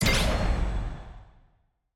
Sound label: tutorial close